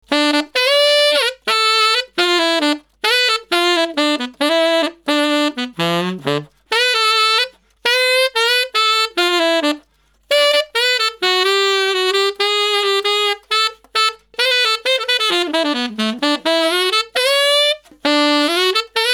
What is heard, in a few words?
tenor, saksofon